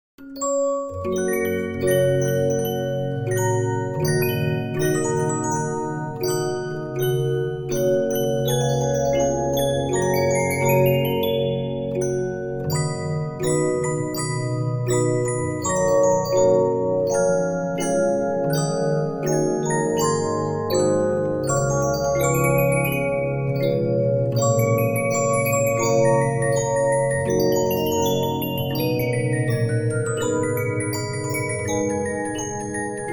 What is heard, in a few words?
Regina, musicbox, 15inch